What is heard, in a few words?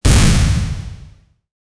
intense sound of flame burning